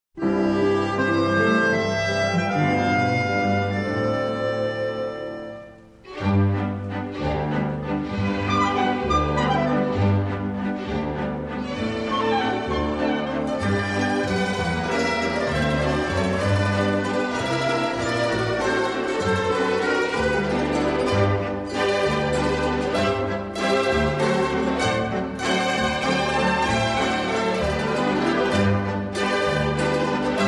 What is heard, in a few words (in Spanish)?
Vals; Clasica